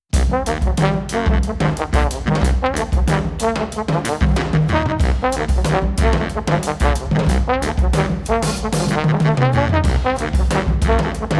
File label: BRASS BAND